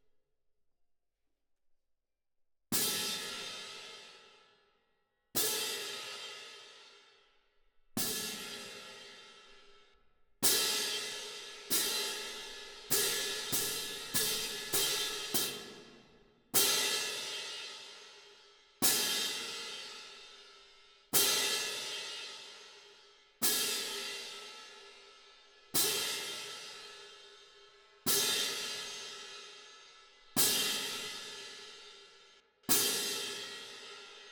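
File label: Cymbal, Jazz for piano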